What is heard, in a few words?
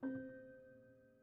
Prepared Piano